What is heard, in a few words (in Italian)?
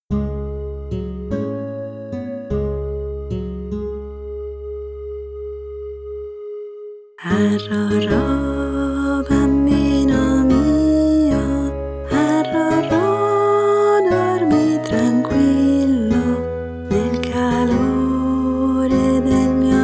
Arrorro Bambino Mio; Fucsia Unicorno